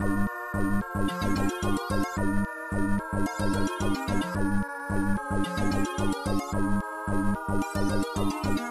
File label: theme loop organ synth; Loops